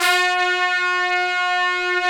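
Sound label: trumpets section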